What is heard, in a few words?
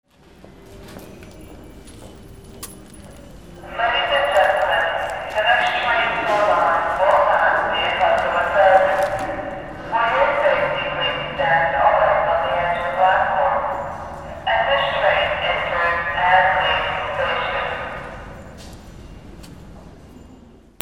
AMBIENCE